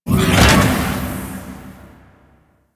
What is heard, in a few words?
Moon Platform Lock; Ambience; Orokin; Warframe